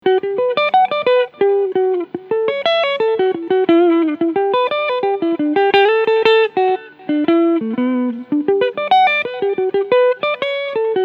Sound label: Cleansound Front PU